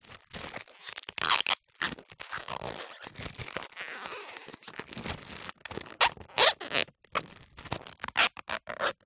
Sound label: foil birthday banner handling rub with finger squeaks